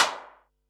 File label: Perc, Percussion and FX; Pearl SC-40